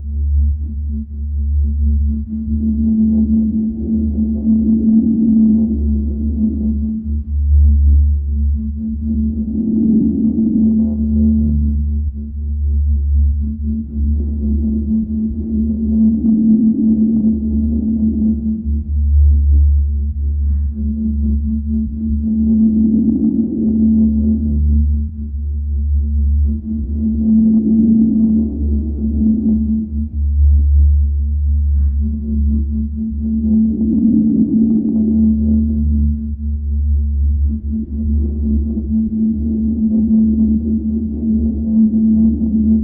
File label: deep sleep meditation, background noise